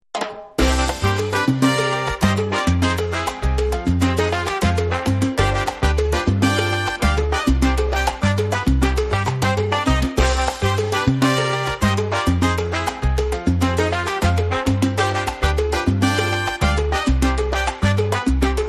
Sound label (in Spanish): Musica salsa